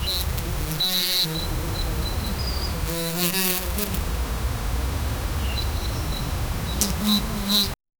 click of the tranquilizer; bee buzzing

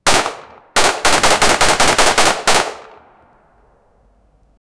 m4a1 rifle single shot; guns

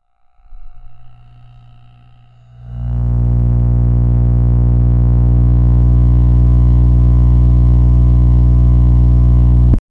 the low warm rising hum, amplifier

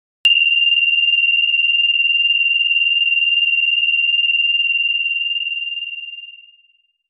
cursor

